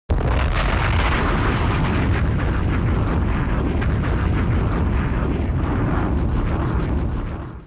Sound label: Bomb sound effect